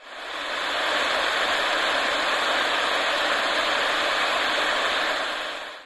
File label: radio static